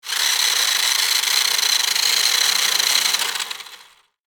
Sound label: Remote controlled sport car road movement; rc toys